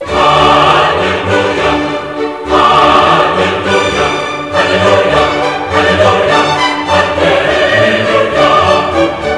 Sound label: hallelujah